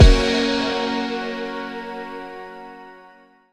Retry Jingle; Friday Night Funkin Funkadelix

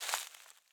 foliage hit

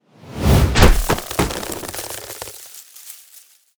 Special Wooden Club; LooterKings

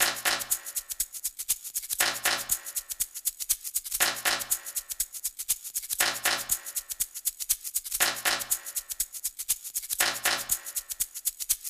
Jungle Perc

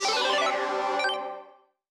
levelup; roguelands

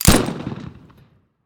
tools gun

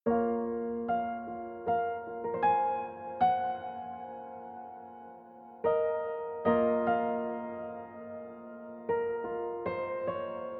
Phrase Piano

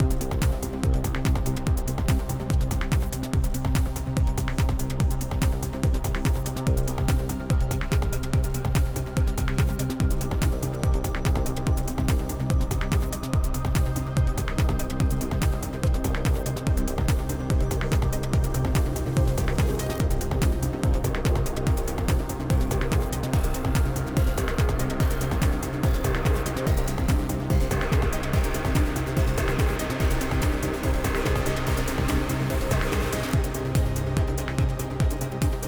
Chase, 144BPM

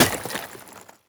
ice break; teardown ps5 windows xbox series xs gamerip 2022

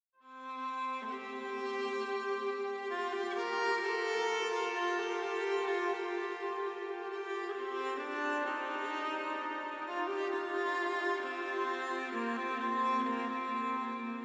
Exploration Mesa Outskirts; Horizon Zero Dawn

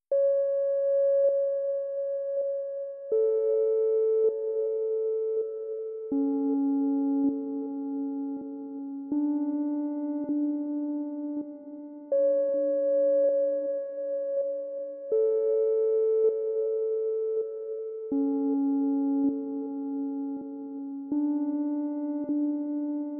Sleep; Evan's Remains

